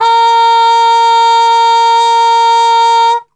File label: A#2, Brass, TRP CUP MUTE; Best Service; Brass Super Section; 90 s Sample CDs